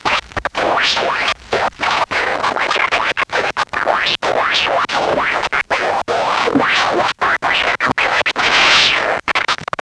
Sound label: walk talkie statics; communication